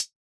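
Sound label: Analog Hi-Hat Closed; Yamaha DJX-2B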